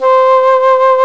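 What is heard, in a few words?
Alto Flute vibrato; Roland L-CDX-03 Disk 1; A.Flt vib 2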